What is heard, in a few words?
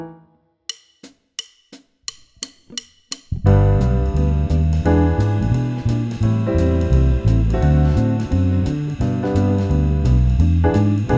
swing